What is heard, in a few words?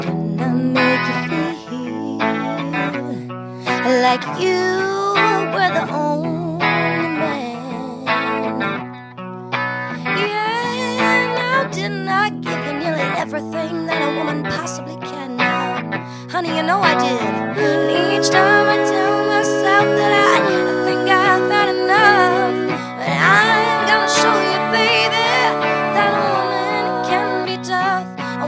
Rock, vocal